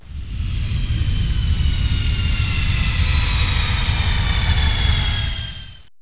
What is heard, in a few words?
landing dropship